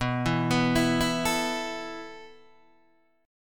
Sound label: B 7th Suspended 4th B down Guitar Open D